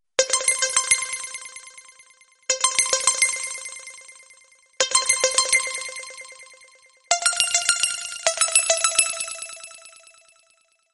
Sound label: short tone